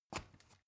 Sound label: step ladder wood single